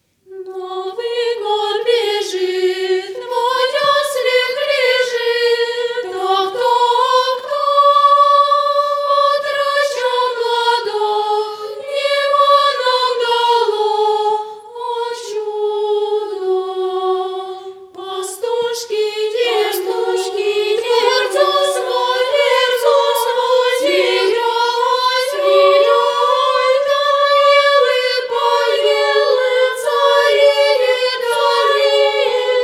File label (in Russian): Хор Воспитанниц Православного Детского Пансиона; Покровского Хотькова